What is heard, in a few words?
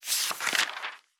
Book Page; Fantasy Sounds Bundle